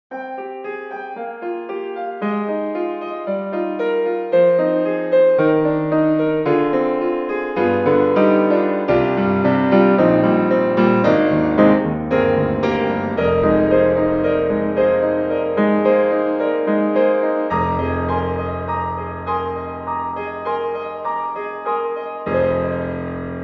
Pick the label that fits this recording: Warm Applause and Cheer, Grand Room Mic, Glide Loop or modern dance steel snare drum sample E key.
Grand Room Mic